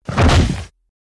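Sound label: falling sounds; zvuki padenija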